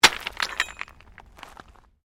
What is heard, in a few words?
Digging Pick Stones